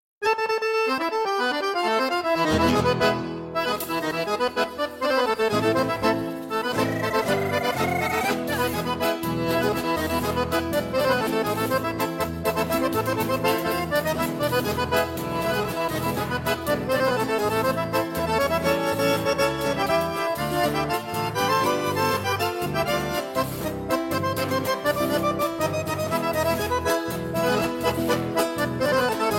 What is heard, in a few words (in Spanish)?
Cueca KARAOKE